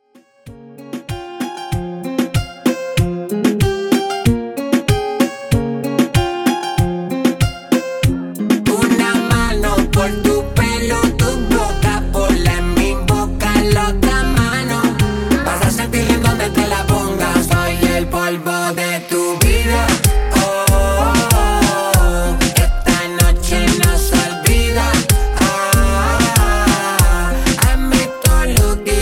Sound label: 95 Bpm